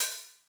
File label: Hat Open Accent Mid Decay, 909